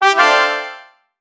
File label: small satisfying sound, achievement